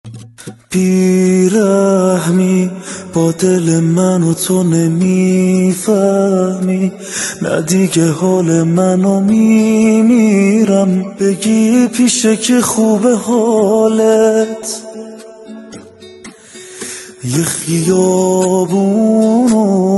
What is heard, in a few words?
Guitar